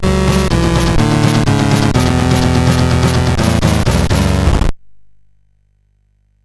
intermission sid CSG8580R5

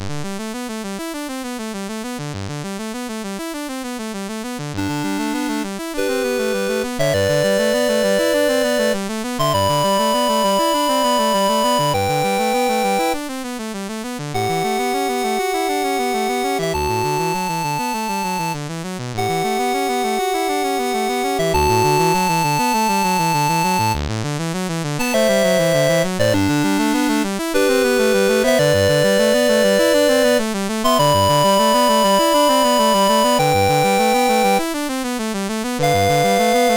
Prelude in G Major, MOS6581R3

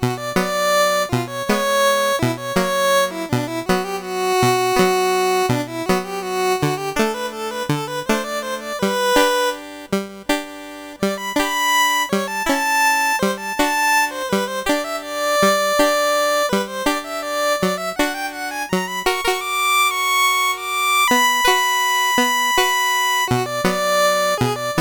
Barcarolle, MOS6581; Syl

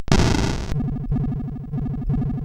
Die Alien Slime, CSG8580R5

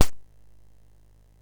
sid MOS6581R2; California Games

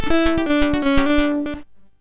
sid MOS6581R2

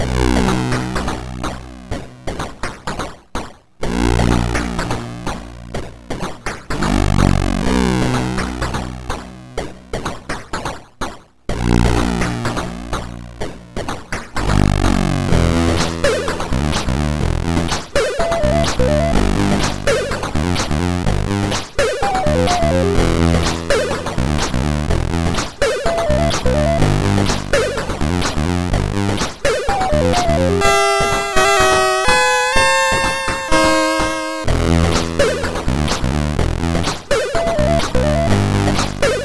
sid MOS6581R4

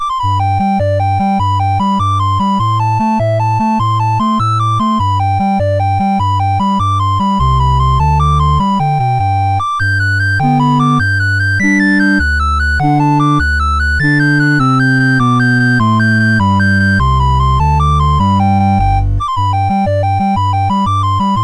Traditional Irish Jig, MOS 6581; MOS6581R4

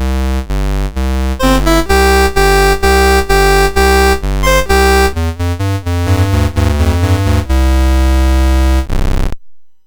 MOS 6581; Slaughter; MOS6581R4

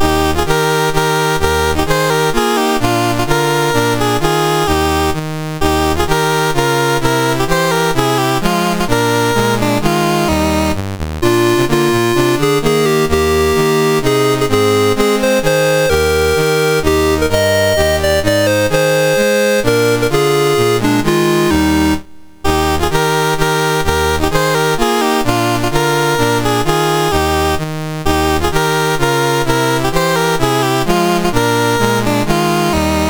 MOS6581R2, National Anthems; Poland

